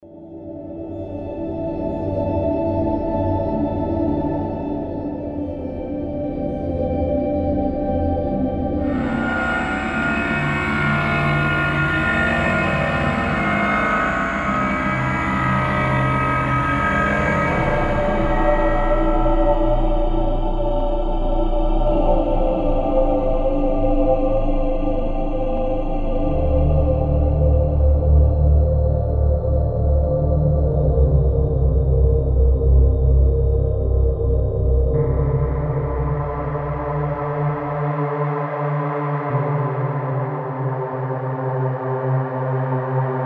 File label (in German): Einzelsounds Ambience